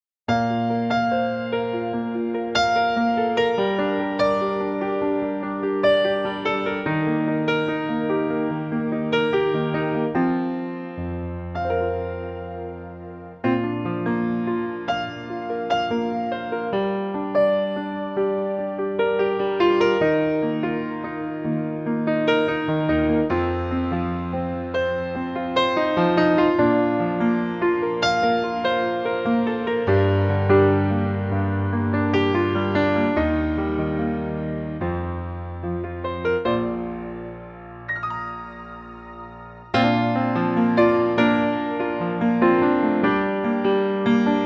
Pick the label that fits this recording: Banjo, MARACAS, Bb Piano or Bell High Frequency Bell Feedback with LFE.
Bb Piano